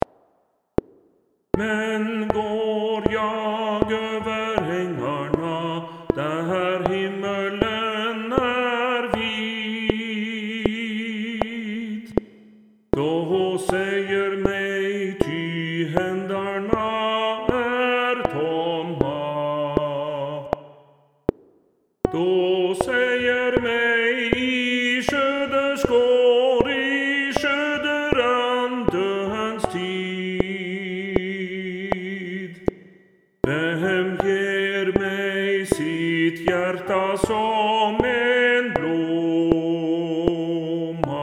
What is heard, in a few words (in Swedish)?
Tenor